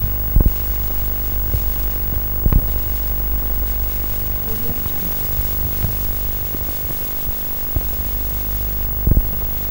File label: static noise